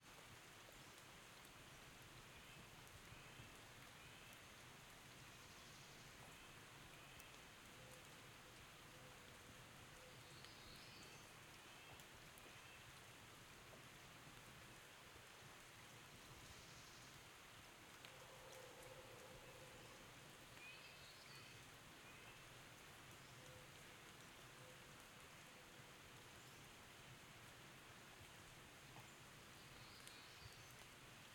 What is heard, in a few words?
Ambience, Forest, Birds, Wind, Water, Spring in the Pacific Northwest; Ambiences; Raconteur Sound